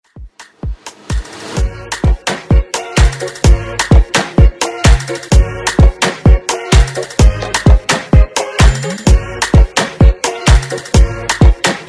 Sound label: Afrobeat Redrum